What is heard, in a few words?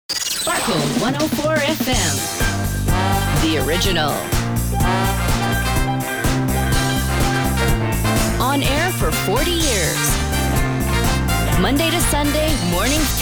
Radio Funk Jingle; Gangstar West Coast Hustle; Android